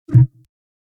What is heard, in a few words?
hover